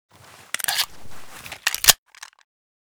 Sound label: reload